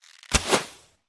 Bow launch; we are the dwarves gamerip 2016

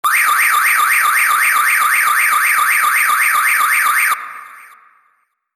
Car Alarm; Breaking The Bank

